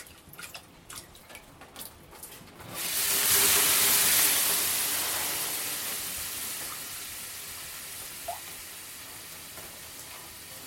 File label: sauna steam